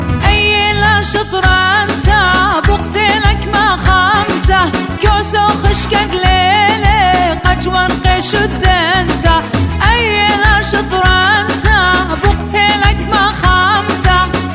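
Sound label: Is Calling R&B, ringtones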